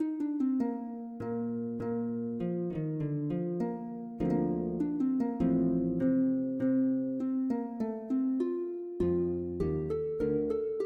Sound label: Hornpipe